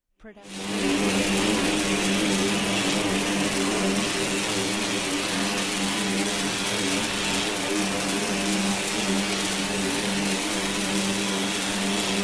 dark magical energy; soundscrate

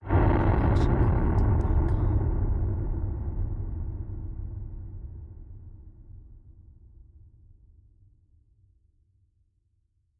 Distorted Low Rumble Hit; SoundsCrate

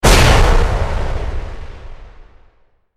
large explosion; soundscrate

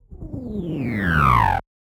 Rotor Charge Down; SoundsCrate

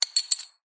coin drop; SoundsCrate

